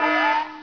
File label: alarm; Descent